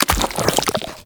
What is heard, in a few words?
Step Sludge; Footsteps; Walk; Warframe; Characters; Tenno